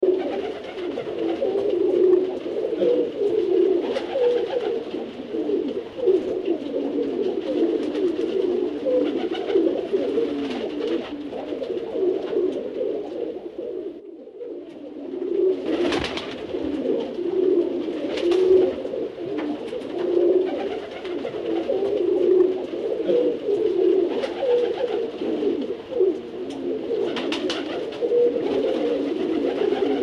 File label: atmo birds many pigeons